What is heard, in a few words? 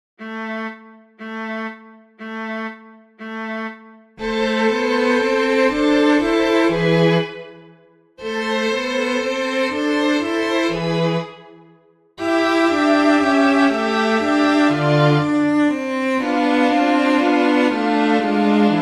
cello music; Bach; ”Gute Nacht” from Motet No.3; モテットNo3「グーテ・ナハト」